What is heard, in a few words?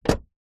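vehicles car door close gentle exterior; zapsplat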